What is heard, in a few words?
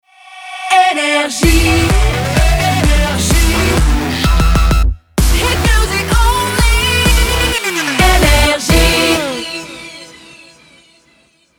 jingle; NRJ